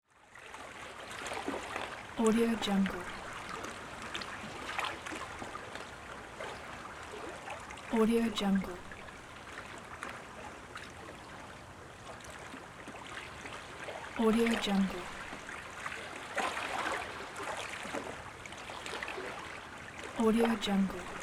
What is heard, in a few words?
water